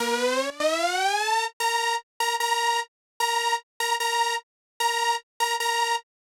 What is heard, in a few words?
Hoover; FX; Cymatics - Humble Hip Hop Sample Pack